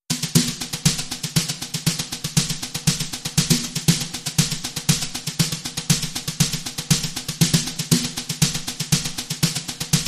Brushes and Kick

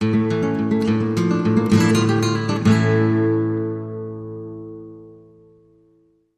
Spanish Guitar Bridge

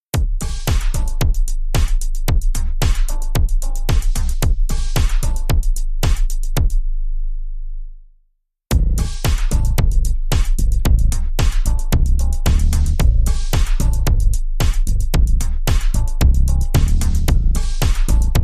The Trance Drum and Bass

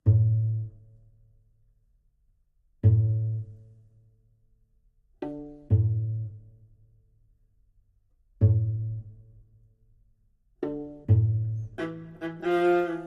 Spiccato, Short

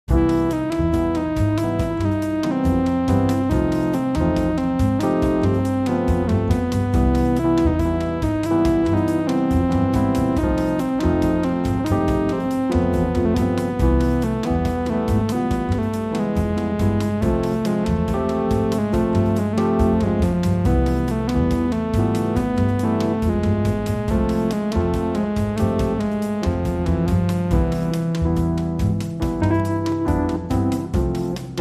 Arabian Rumba

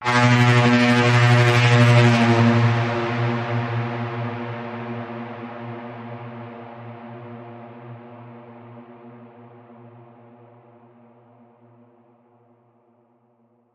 Hairpin Turn

